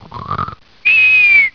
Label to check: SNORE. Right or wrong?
Right